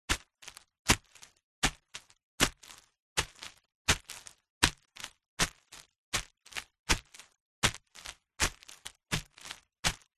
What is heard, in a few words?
foley footsteps on plastic sheet